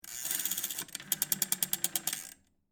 Telephone Rotary, Technology, Telephone, Dial, 0, Candle, Stick, Phone, Antique, Zero; Telephone Sound Effects; BlastWave